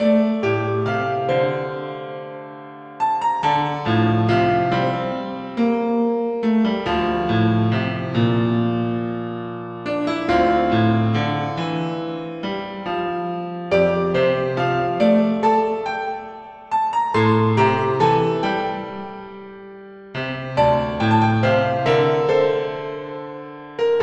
bgm comic key piano

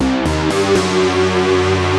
Bleep Bloop start; Slinki

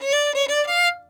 Violin Missednote; Levels Hub Diner 02 Light Buford

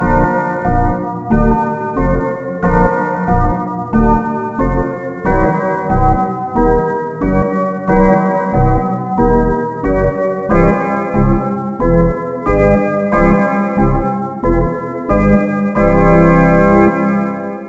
Ditty; NBA ShootOut 2001

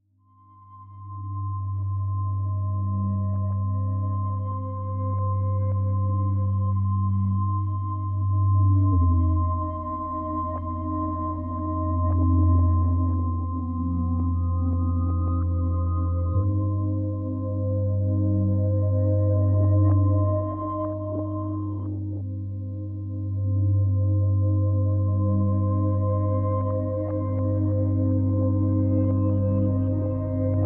Junkyard Night

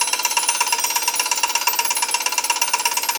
Recieve Gems Meter Loop; Angry Birds

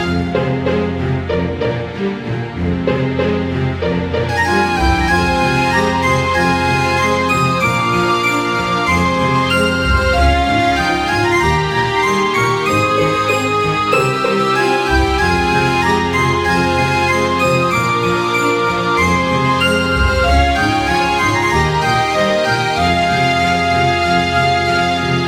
Flat forest; Flat Kingdom Paper's Cut Edition

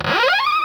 Chest Creak; Fx Chest Creak

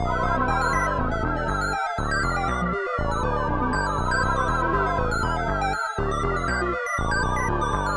Long Hallway E - Lovely

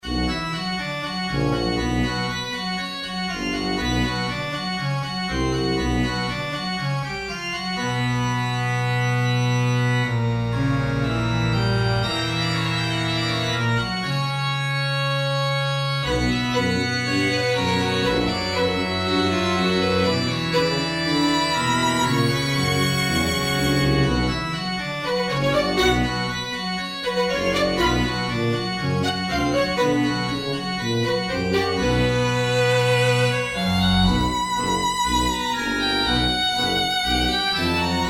Halloween Menu; Castle of Pixel Skulls

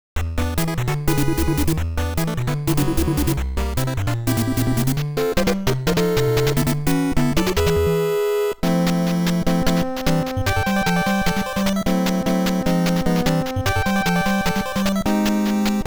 1P WIN; Excitebike; Mach Rider; Game Sound Museum Famicom

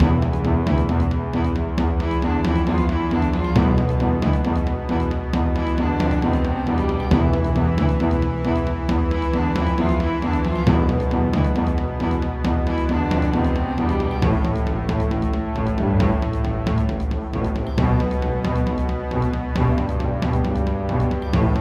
Boss Music

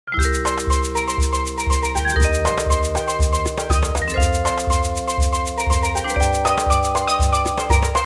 Underwater Theme; Kona's Island Rush; Android, iOS, Mobile

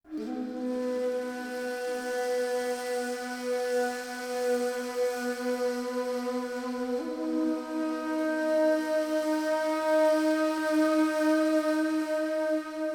Orient Flute; rise of venice windows gamerip 2013

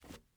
wood jump; teardown ps5 windows xbox series xs gamerip 2022

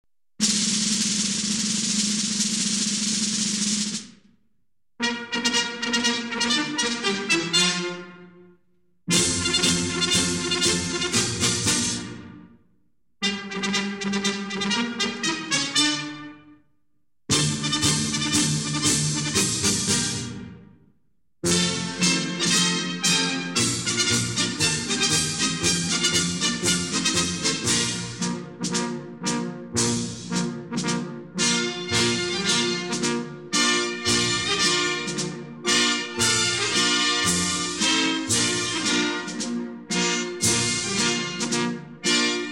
National Anthem Italy

